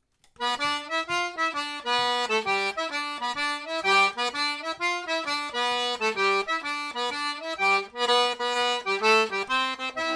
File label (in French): blues du tac tac chant